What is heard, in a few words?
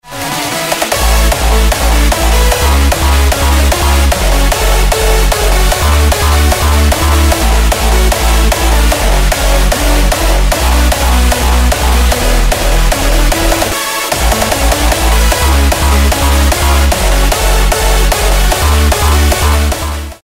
BEAT